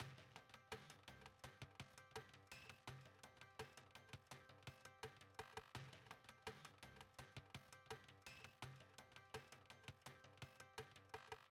music interactive; World of Tanks

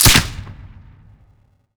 bulldog 1shot cave

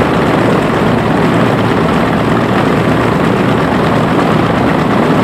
engine bomber mid; War Thunder; engine ai bomber rpm00

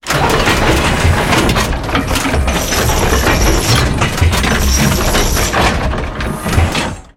reload cannon auto, tanks

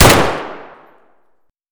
rsass fire indoor close; Escape from Tarkov

